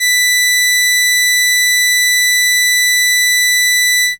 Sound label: Violin B6, ARP Solina, Unmodulated; musicradar-synth-samples